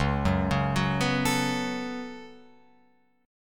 C sharp 7th Suspended 4th Sharp 5th, C sharp, down, Guitar, Open F; arpeggiated; chord